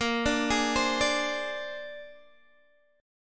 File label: Bb 9th Bb down Guitar Open G